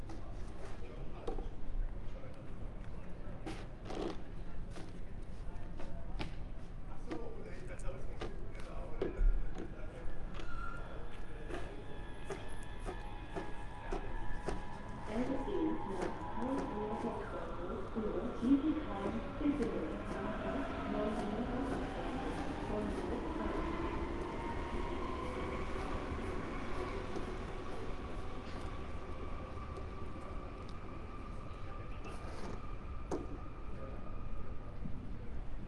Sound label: ambience small train station people talking train arrived depart announcement